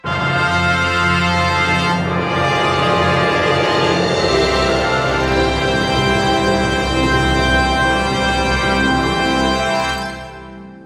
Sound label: symphonic cue; best service